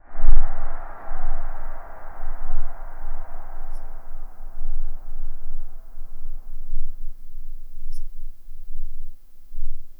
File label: space travel on space, travel